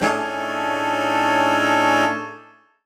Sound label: Horn Swell Alt Amaj7b5; undercover samples